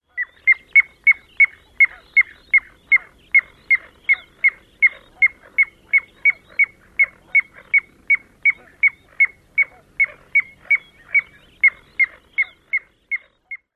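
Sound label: alarm peeps, Piping Plover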